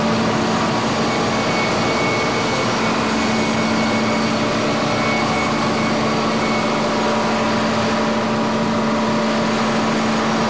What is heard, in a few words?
process vessel; Factorio; adamo-chemical